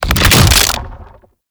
Head Spin; Warframe; ManInTheWall; Octopede

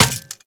Worker Metal Leg Step Default; Warframe; Characters; Solaris

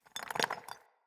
Smithy Rock Crumble; Ambience; Soul Plane

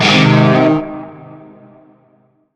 Shawzin Min Chord; Warframe; Narmer Shawzin

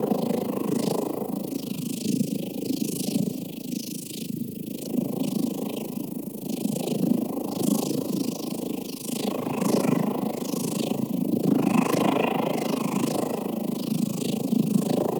Speedball Catch Vortex Loop; Warframe; Missions; Tenno Ball

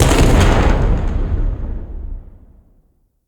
Siege Distant Explosion; Midrath; Warsong Prologue

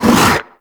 Barrier Cast; Warframe; Brawler

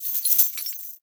Bird Three Body Shake; Warframe; Entrati

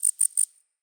Wetland Forest Day Insect Close; Midrath Island; Ambience

